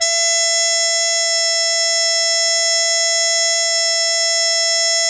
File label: MUTE E2, Mood Trumpet; E-MU Formula 4000 Series Vol. 1; Hip Hop Nation